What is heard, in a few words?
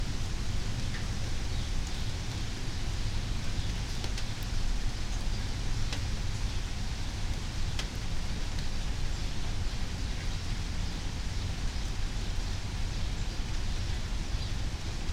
night rain suburban, Meridian ID